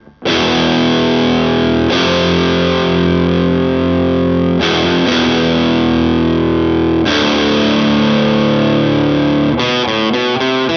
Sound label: Music Man Mariposa